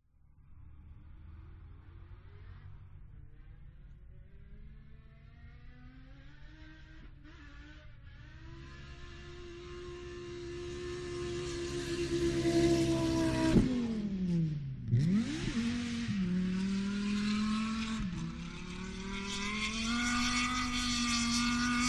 drift car approaching fast breaking into a turn passing with a slide stop; Sound Ex Machina